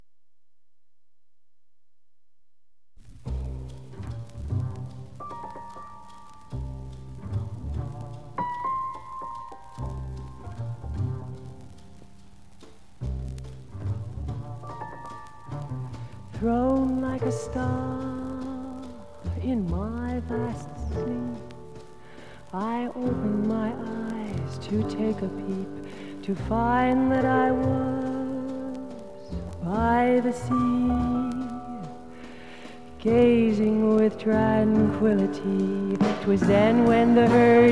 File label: hurdy